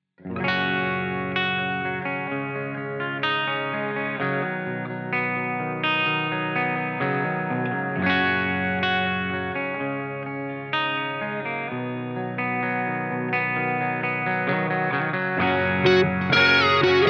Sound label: Plexi Bias